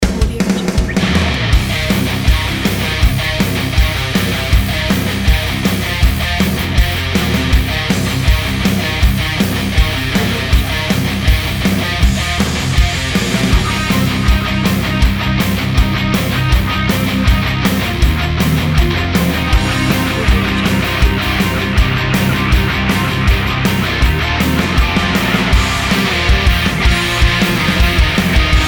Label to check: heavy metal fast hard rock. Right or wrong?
Right